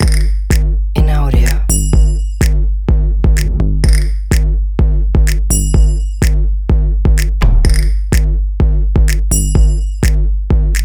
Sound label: Stomp And Claps